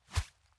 woosh; Ion Shift

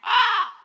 Crow Run

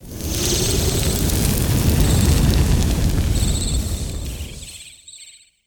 Incandescent fireflies; Legend of Keepers (gamerip) (2019)

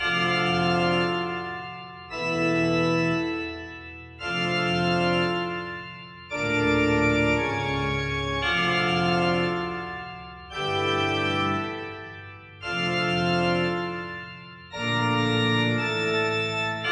Loop

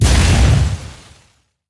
Building Part Smashed

